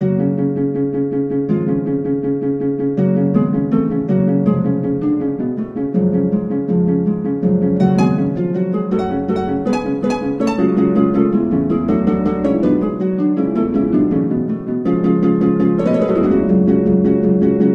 Minstrel Shield Harp; gamerip; the bards tale remastered trilogy

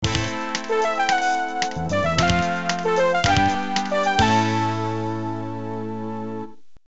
Player Lose; Schmeiser Robo; arcade

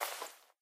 Propeller hat Sleepy Off; doodle jump 2; android

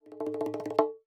Tactics Enemy Discovered

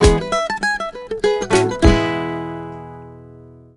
Jingle Normal; tamagotchi connection corner shop 2 ds; Mame

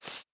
character card disappear

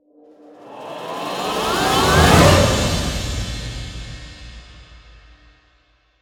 Bossfight Ending; Skellige

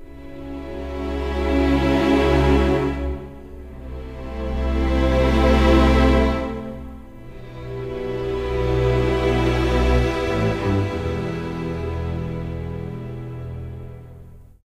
fail mission; Hidden Dangerous 2

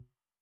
Loop Tail; Good Job

